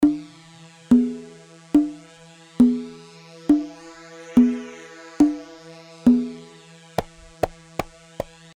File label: Ras; Ras 1 راس